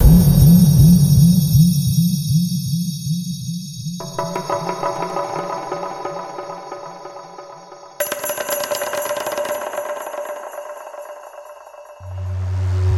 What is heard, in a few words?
Percussion Street Instruments; 世界街头打击乐; 来自巴西、古巴、非洲、牙买加、波斯和印度等地的乐器; RARE Percussion Street Instruments Vol.1; 世界街头乐器; 道格·菲奇 (Douq Fitch)